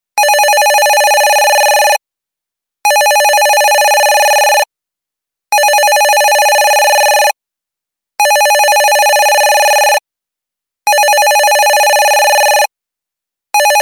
Different old phone

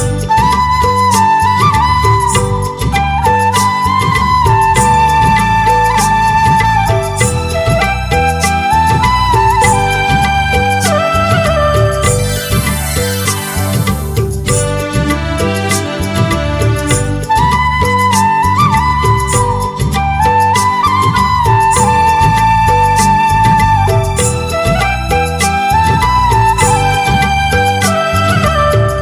soft romantic flute; ringtones

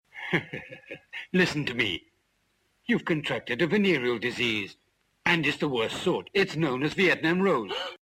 Vietnam music; hip hop

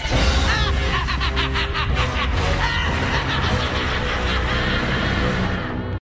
laugh

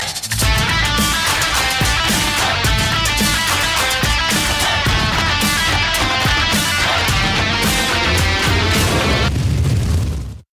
Tap Ringtone

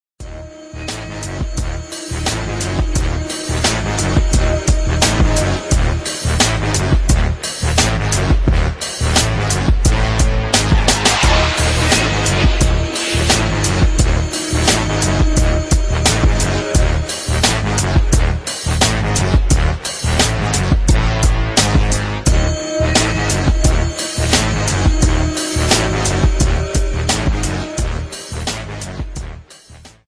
instrumental

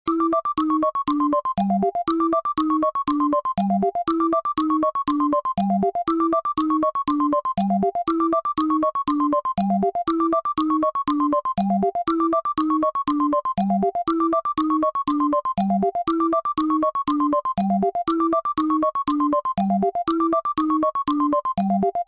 xylo down

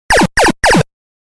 8bit

